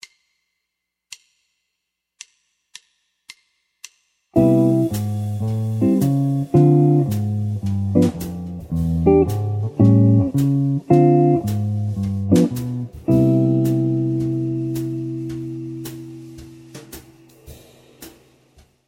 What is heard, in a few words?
walking bass